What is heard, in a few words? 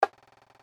Paytable Prev; slots; Majestic India